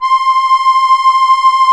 Musete, Accordians, Roland; Club-50 - Foundations Roland

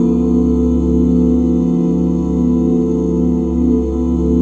OO C# SHARP9, OOH CHORDS, Vocal Jazz; ILIO; Vocal Planet; Jazz & FX

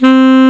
C2 SMO SAX; AKAI S-Series CD-ROM Sound Library VOL-8

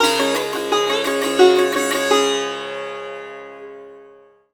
SIT, India; Best Service; Ethnic Journey Sound Cube

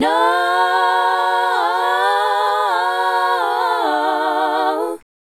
Female Group, Hip-Hop and RnB Vocals

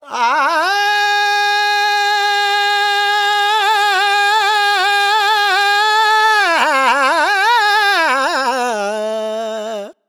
Vox, Vocals Male, World Pop; Sonic Foundry (Sony Creative Software)

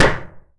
PUNCH, Anime Sound FX; AKAI Anime Sound FX

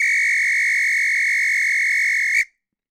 Samba Whistle; Sonic Foundry (Sony Creative Software); Joe Vitale Latin Percussion

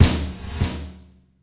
Gated Snare; Univerb; creative gate; Gated Reverb

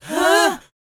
BOYZ D#, Vocal Planet R&B & Dance; ILIO

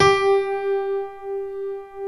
DIG ACU PIANO; OMI - Universe of Sounds

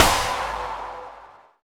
Drums; Distored Reality

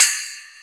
Splash Springy

